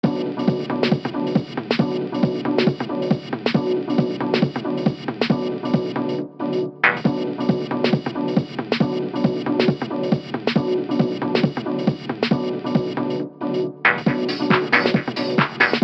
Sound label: BoomBox loop